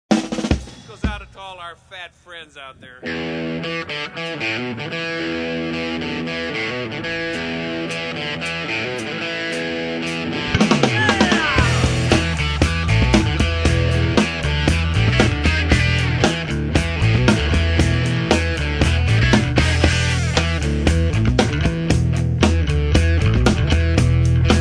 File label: funky